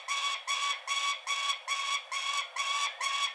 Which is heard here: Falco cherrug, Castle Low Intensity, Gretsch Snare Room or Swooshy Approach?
Falco cherrug